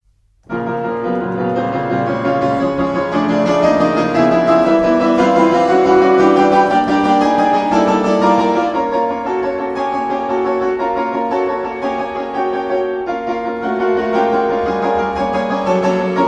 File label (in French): PRELUDE 22 EN SI BEMOL MINEUR